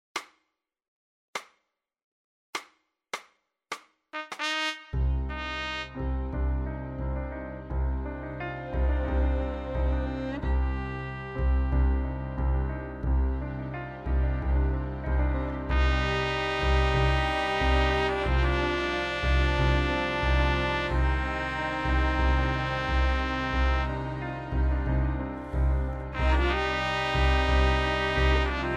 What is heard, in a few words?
playback full referencia trumpet